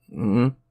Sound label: Chorus really sad